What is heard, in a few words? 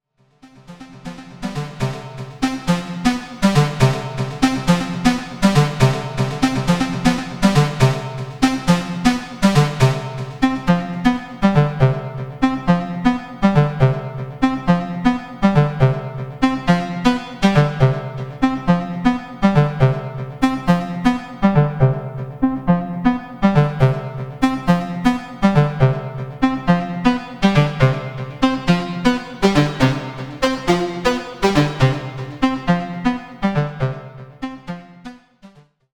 DX7 V Seq